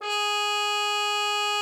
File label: CUP MUTE, G#4; Xsample VOL-3